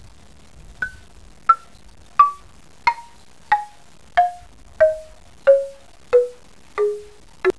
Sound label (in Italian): musica strumentale